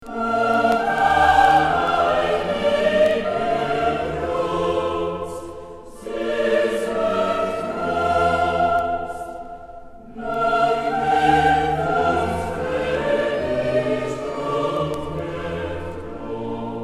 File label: Motet; Bachchor Stockholm; Bach 6 Motets